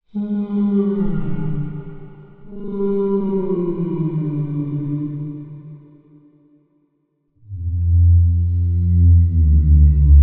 animals whale large

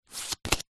leisure playing card turn over on top of deck

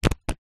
game cards short shuffle; uno

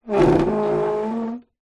foley plastic thin object slide table vibrate rub; zapsplat

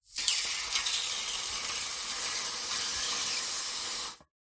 food lemon slide drop onto hot metal sizzle; zapsplat

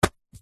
foley foam block dense stab sharp knife

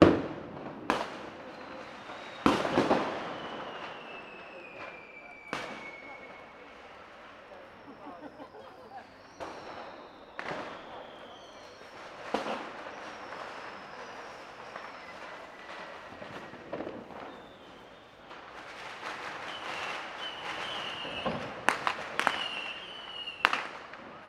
fireworks street urban; sound effects one

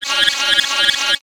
sound design effect vox repeater; sound effects one

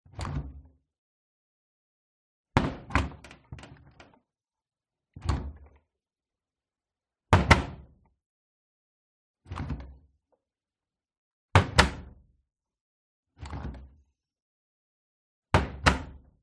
Washing Machine Door Open Close; PMSFX

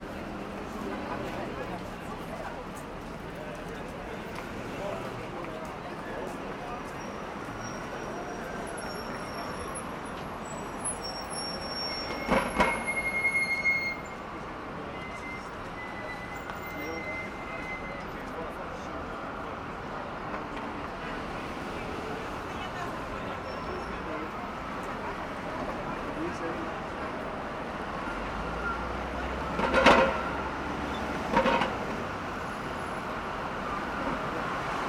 ambience urban city nyc times sq siren; sound effects one

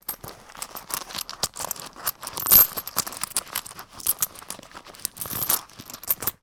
wallet purse rummaging coins; zapsplat

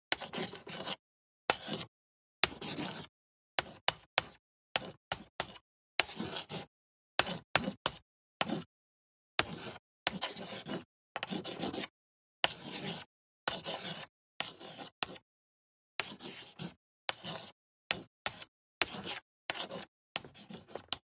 Chalk, writing; lilesound

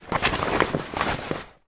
foley bag holdal polyester movement short; zapsplat

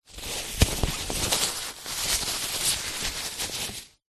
mask fabric sleep eye handling; zapsplat

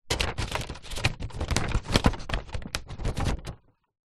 foley paper set into electronic typewriter feed

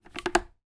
household plastic mop bucket pick up

